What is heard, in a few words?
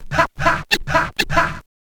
scratch kit, vinyl